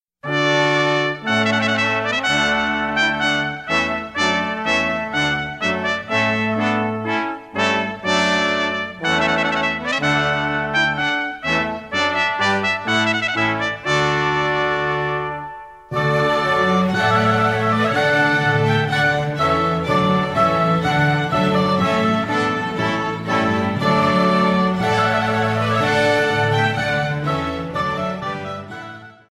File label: Trumpet Voluntary